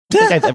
cute laugh

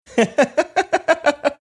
chuckling

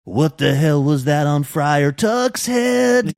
singing

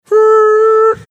imitating sound line whistle

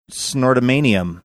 snort

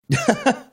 short chuckle

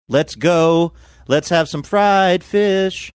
singing let's go let's have some fried fish

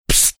"psst"

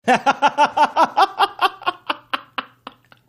awesome laugh

